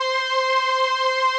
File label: 505, String Machines